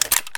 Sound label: taurus click, weapons